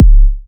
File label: Minimal Kick, Drums, Kicks